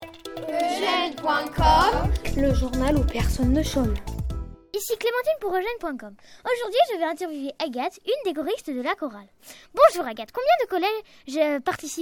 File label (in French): chorale; objat college freyssinet journal